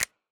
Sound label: Snap; Drum Kit